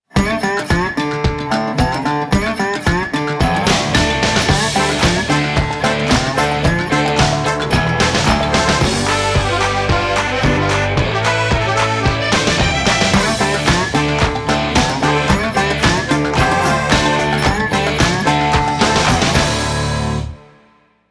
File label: Southern Funk